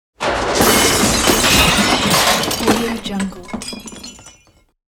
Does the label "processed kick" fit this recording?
No